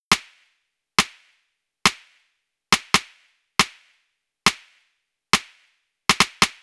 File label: Snare Loop, Drums, Dance Beats